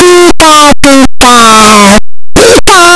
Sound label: pikachu cry; pokeyellow